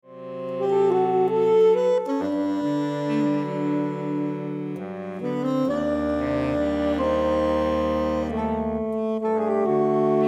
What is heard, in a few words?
Canto; Canto per Madre: Andante cantabile